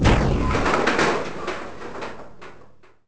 Door Open; Sotha

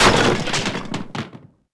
BAR BRK

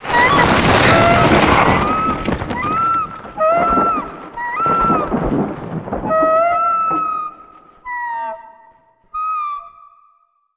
Bird Trapped; Myst III Exile

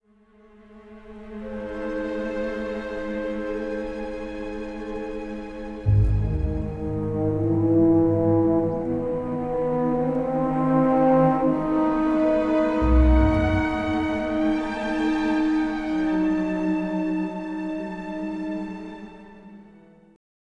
music flourish, intro

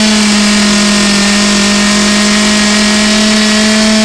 moto 10000 rpm, motox; terrains; Tony Hawk's Project 8; XBOX

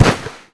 Ball Hit Net

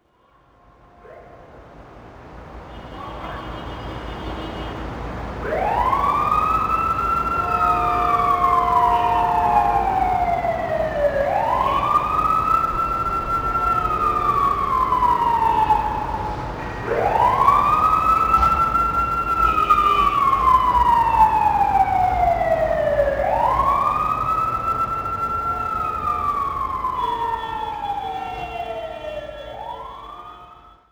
Firetruck siren US; ambience; Project Gotham Racing 2

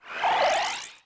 Round Win screen; interface

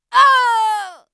hurt; True Crime Streets Of LA; XBOX

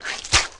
Spit, Valleakan Mutant; Valleakan Mutant 2; Aliens

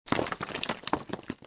dirt, ambience; Star Wars Jedi Knight II Jedi Outcast; XBOX; artus

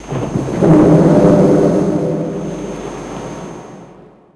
Whale Breath; Finding Nemo; XBOX

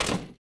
fs metal, droids robots

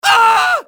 groin, pain death, male; Soldier Of Fortune II Double Helix; XBOX

